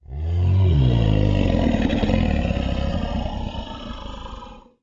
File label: dinosaur